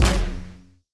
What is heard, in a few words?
hit wave medium; CrossCode; battle